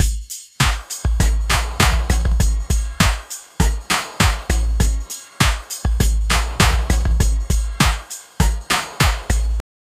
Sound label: a disco loop no drums